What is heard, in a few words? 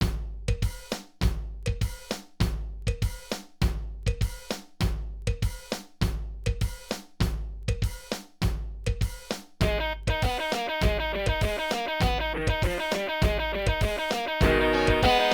Funk Rock Remix